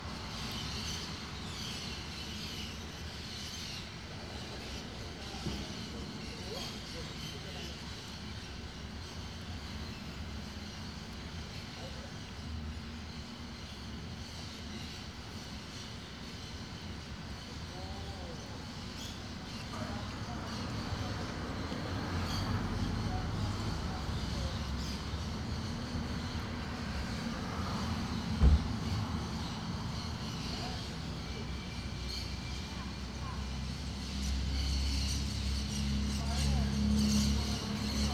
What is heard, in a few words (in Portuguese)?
Ambiencia entre predios goiania fim de tarde com passaros, carros passando; pessoas; ambiencia urbana; Coleção Sonora do Cerrado